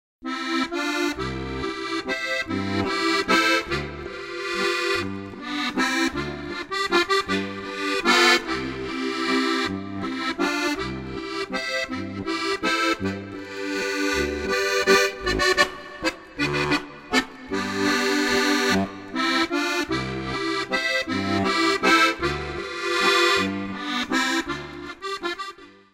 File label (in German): burschenlieder walzer